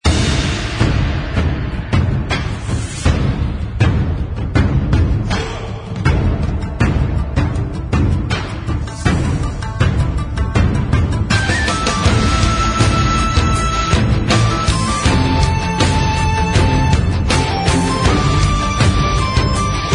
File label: Bgm Main; Junior Three Kingdoms; android